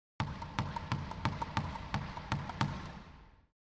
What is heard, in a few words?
Racquetball Bounce